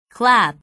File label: clap